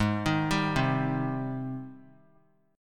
Ab Major 7th Ab down 3C 3F 3A 3D; AbM7 arpeggiated; JGuitar

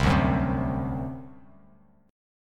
C Suspended 2nd Flat 5th C down Bass Standard; Csus2b5 strummed

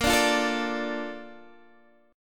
D Minor Bb, guitar; Dm/Bb strummed

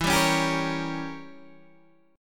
E Diminished 7th E, Guitar Open G

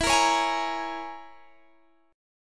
Db Minor 6th E

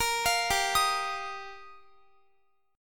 G Minor 7th Sharp 5th G, Guitar; Gm7#5; arpeggiated; x,x,20,22,19,22

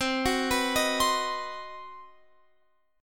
C Minor Major 7th Flat 5th chord, Guitar Open F; CmM7b5; arpeggiated

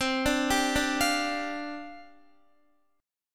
C Suspended 2nd Suspended 4th, down, Guitar; Csus2sus4 arpeggiated

